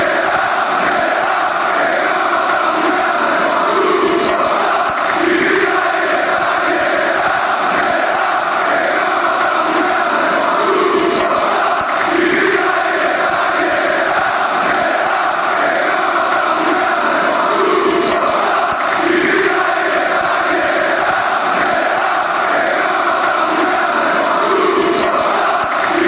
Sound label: chant, fanchants; olimpiakos